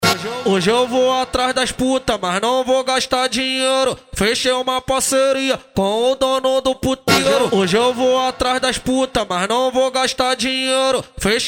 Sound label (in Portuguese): funk ritmado; cuiaba mt